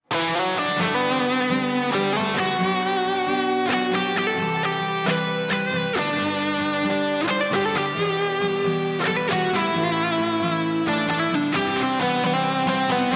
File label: Alternative Rock